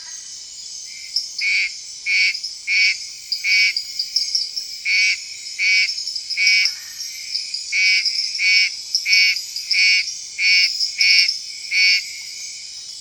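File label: Scinax sp.1, frog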